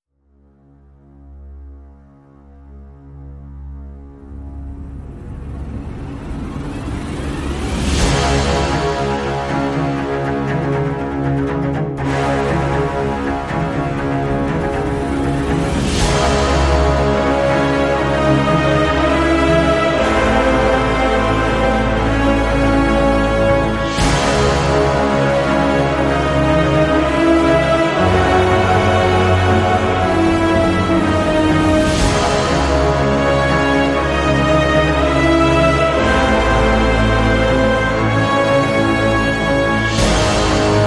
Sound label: Orchestra Only Mix